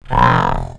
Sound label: roar; The Elder Scrolls III - Morrowind